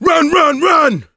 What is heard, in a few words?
yell run; survivor